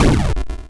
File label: explosion